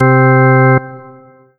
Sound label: Roland SC-88 Drawbar Organ C3